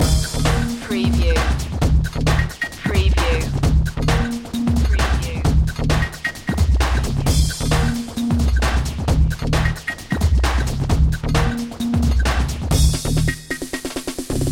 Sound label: push and pump loop